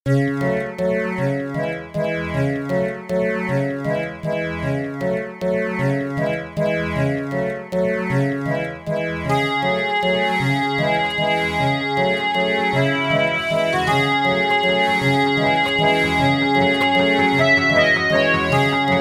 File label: harmonium waltz